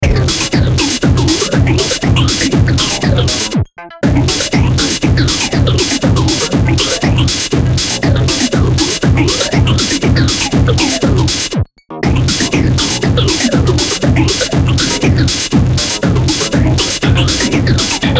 BEAT DISTORTIO, Beat Distortion Synth Drums; Miscellaneous Loops

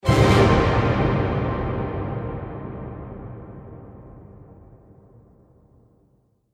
Orchestra Horror Sting; Stinger

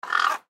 Bird Raven Throat, Bird Raven Throat Squawk; Raspy; Bird Sound Effects; Animal

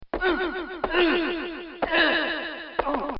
Fight; Machines; recorded in a custom built sound booth

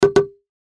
Error Tone Chime Wood Block Multimedia; Web Interactive; Chimes; Blastwave; Technology

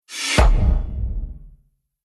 Cinematic Hit, Trailer Sound Effects

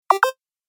Retro Gaming Sound; Video Game Sound Effects

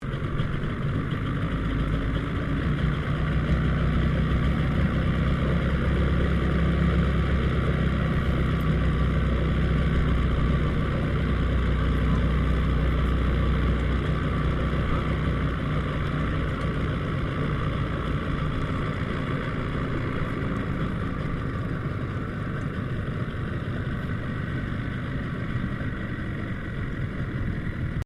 Boat Motor Outboard Johnson55 Idle; Various private boats; Boats (Sound Effects:Vehicles)

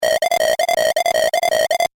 Retro Game Repeating Sound; Video Game Sound Effects

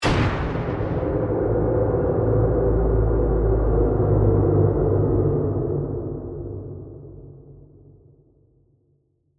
Hit To Whoosh; Production Element, Imaging Element, Accent, Transition; BlastWave

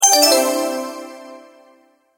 multimedia tone alert

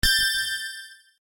UI Rollover Minor, User interface feedback sounds; Computers; Electronics

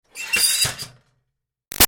Ironing Board Metal Fold Out Screech; Miscellaneous Household; Big Room Sound

